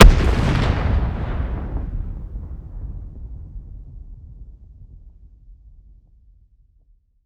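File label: grenade explosion distant; Escape from Tarkov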